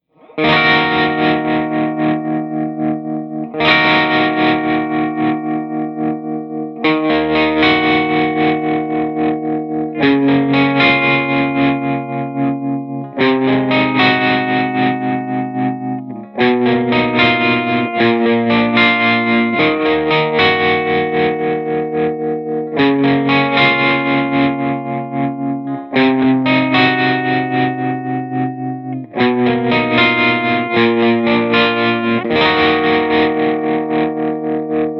trem, tremolo; diamond pedals; some clips also feature overdrive via the boost-eq and/or j-drive; memory lane 2

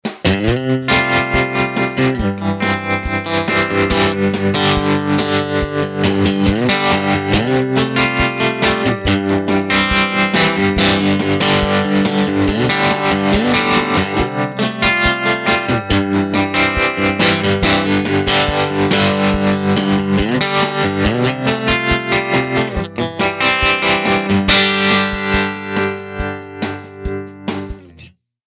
trem train groove, tremolo, fender stratocaster; usa fender stratocaster, blues deluxe and and sennheiser 421; diamond pedals; overdrive sounds from the j-drive mk3 and boost-eq

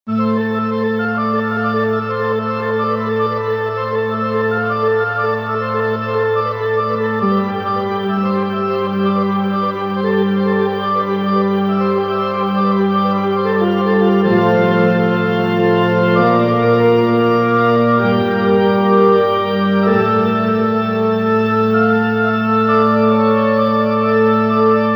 memotron mk2 church organ studio; manikin electronic; mkii church organ (studio)

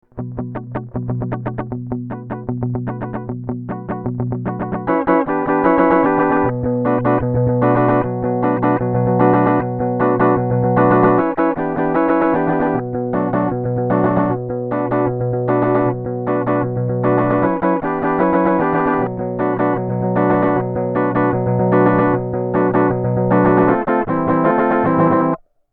multi tap sample, super delay; empress effects

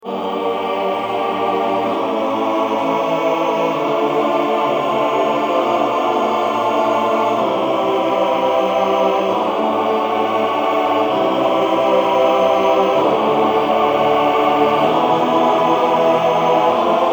memotron male choir studio; manikin electronic

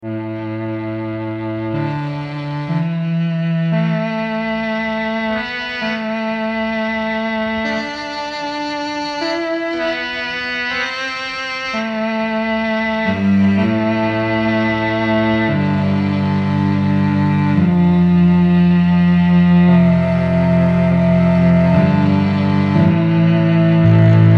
memotron cello vintage; manikin electronic

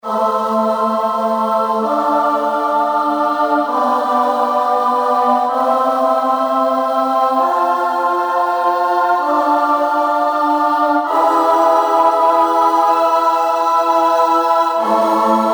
memotron boys choir studio; manikin electronic

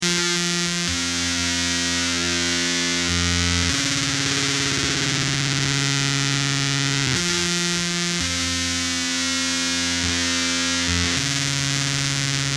GUITAR TRIAD, disasterfuzz; devi ever usa

